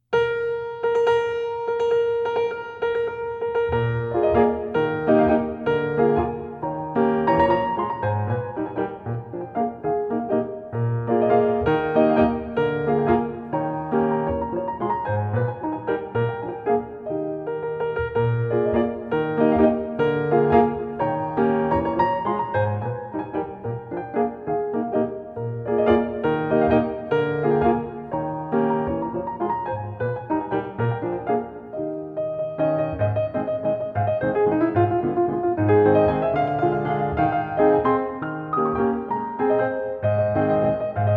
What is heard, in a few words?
Grande Valse Brilliante in Eb Major; Op.18; F. Chopin